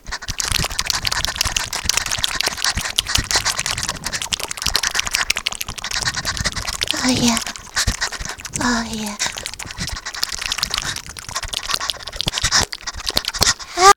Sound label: Fapping